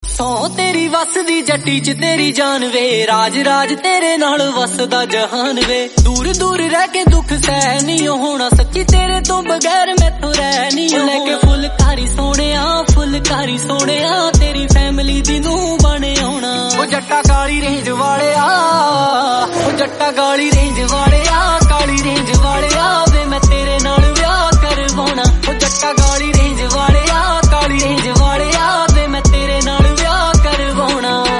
Punjabi Song

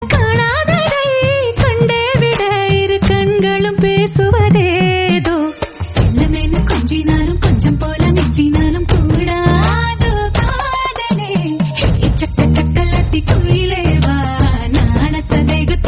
Tamil Song

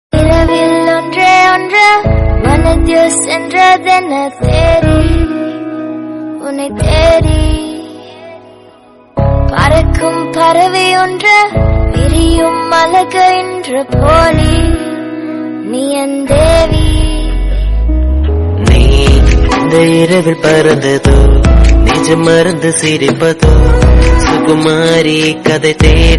Tamil Version

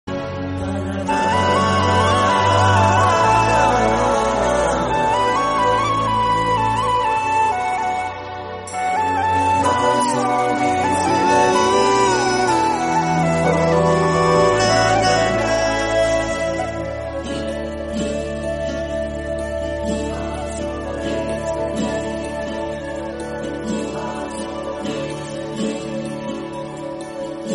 Flute Instrumental; Atrangi Re

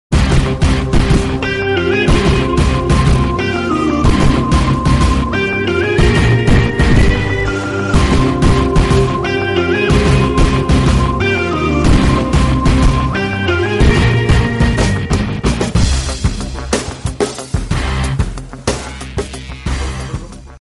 Intro Bgm instrumental; Ajith; Aarambam

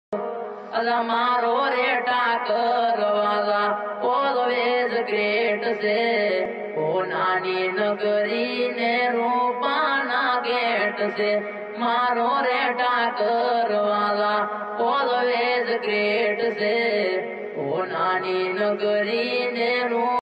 Gujarati Bhajan

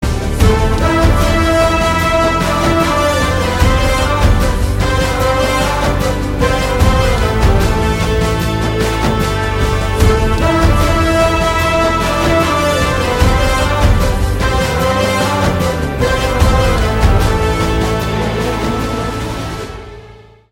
Instrumental; Fighter

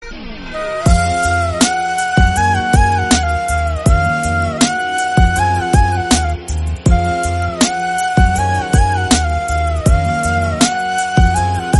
Flute BGM

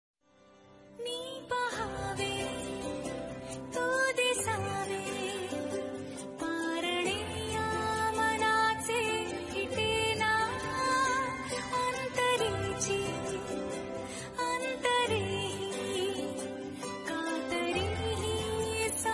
Marathi

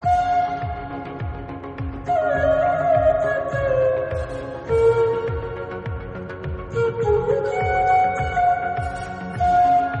Flute

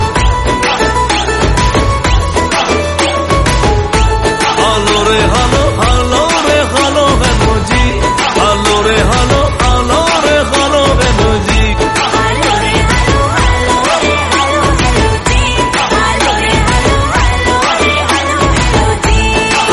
Instrumental BGM

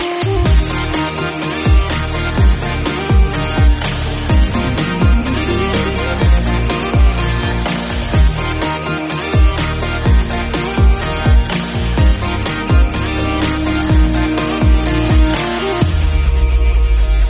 Instrumental BGM

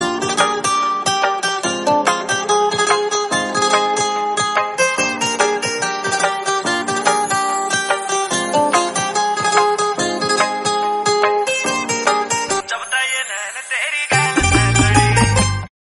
BGM Instrumental

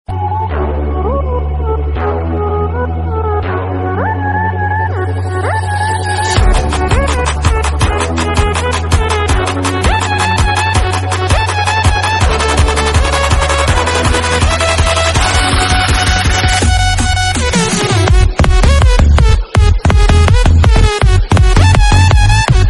Psycho Trance Bgm

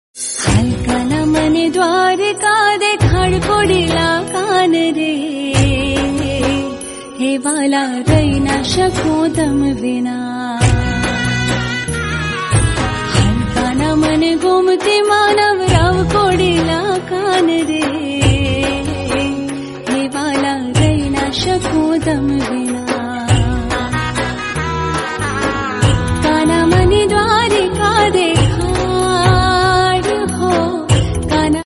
Female Version Gujarati God Song

